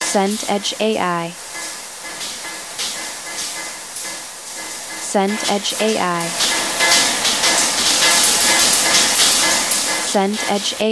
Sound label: rain on roof